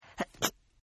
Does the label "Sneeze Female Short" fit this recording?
Yes